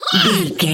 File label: goblin fairy attack